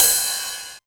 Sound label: 909 Ride; Novation Drumstation